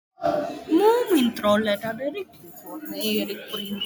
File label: two phones